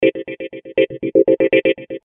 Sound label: Chords 120,000 BPM A